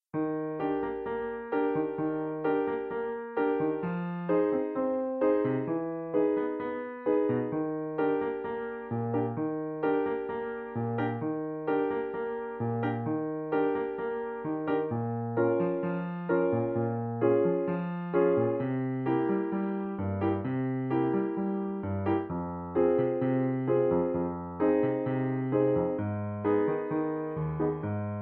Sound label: backing, Bb